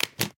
Suitcase Close; peace death 2017 pc

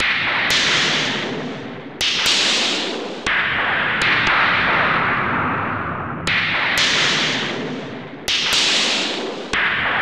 Noise; Brass Numbers; SNES

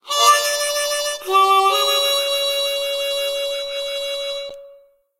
Cabin Stinger

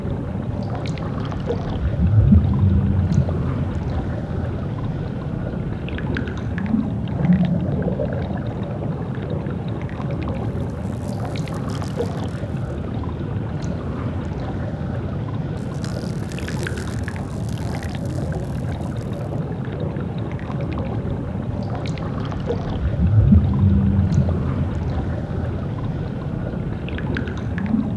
Hub Atmos

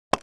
Unscrew Tube

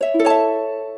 Unlock Item

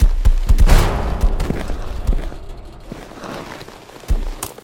grinder stuck; SFX; Mommy LongLegs

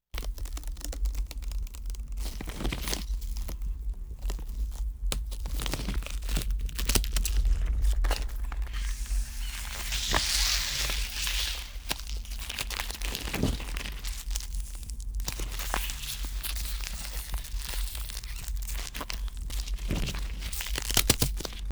Flesh Blood Bone Break Chewing; Lucius III

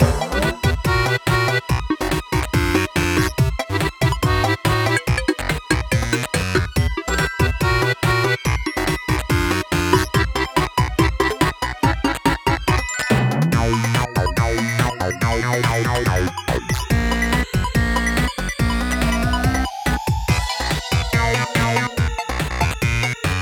Eerie Road; miitopia

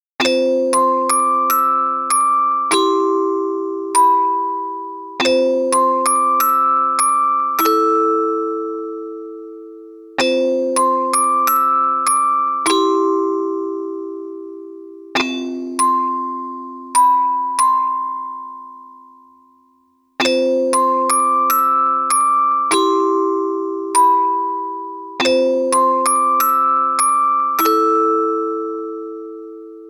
Orgel version

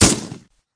Shotgun; super minipix; android

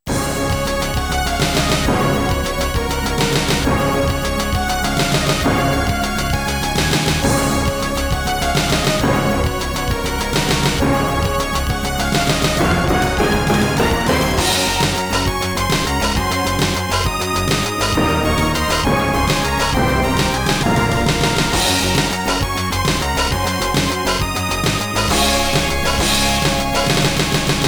YM2151 SC-55; Cho Jin; X68000

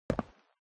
footsteps step Boots Concrete Single Right; FOLEY

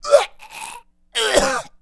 Choke Male

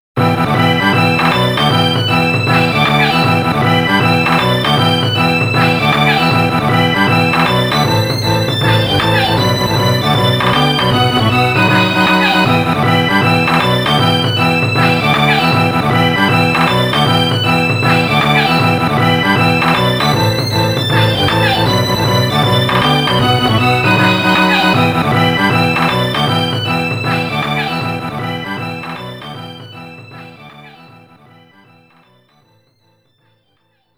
ENDING; Capcom Belt Action Collection

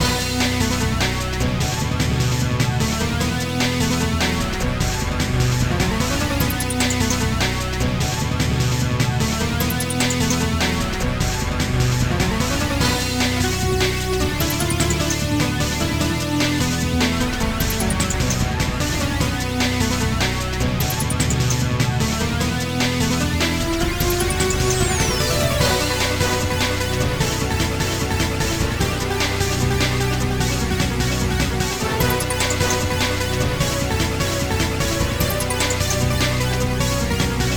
race; Airace; DSiWare

